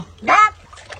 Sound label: cat